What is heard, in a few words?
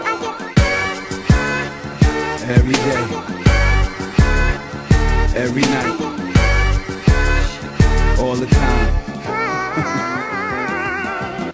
igethihhihihi